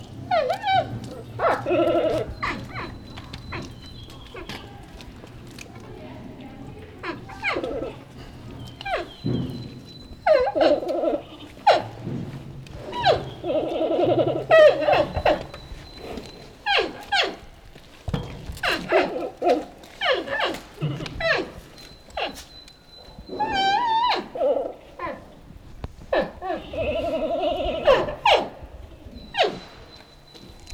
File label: bleat chirp